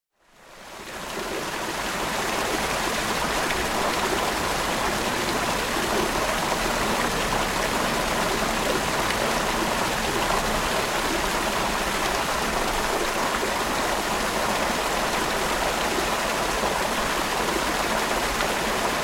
zvuki akvaparka

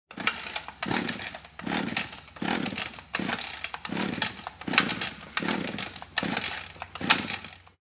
zvuki benzopily

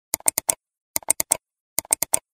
klik myshi zvuk

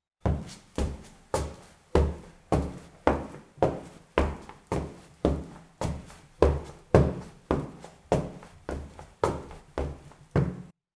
zvuk shagov

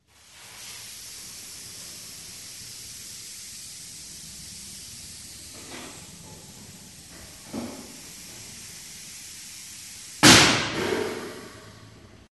zvuki shinomontazha

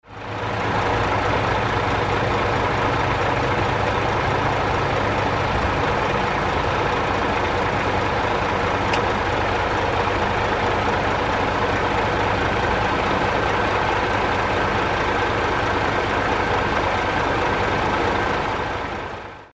zvuki betonomeshalki

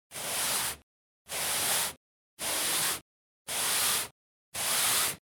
zvuk gubki

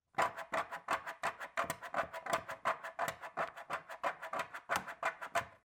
skrebushhie zvuki

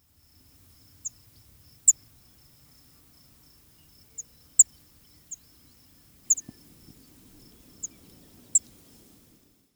zvuki zhavoronka

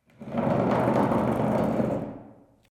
zvuk taburetki

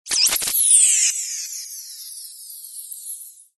zvuki vedmy

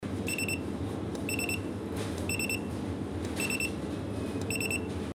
zvuk budilnika

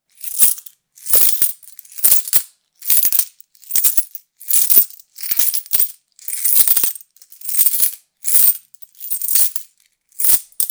zvuk melochi

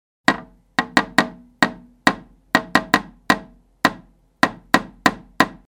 stuk v okno zvuk

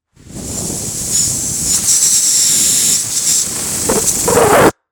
zvuk sduvajushhegosja sharika

